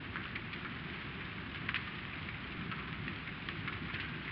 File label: wing gun idle